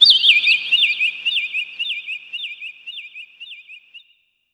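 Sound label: Kawai K5000W Bird Tweet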